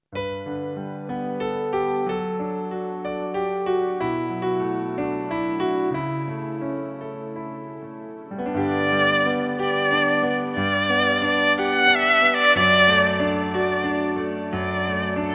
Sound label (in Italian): violino piano